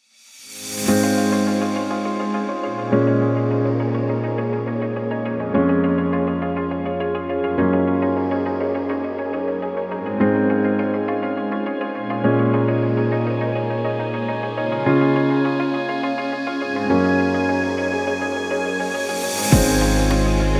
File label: Inspiring Ambience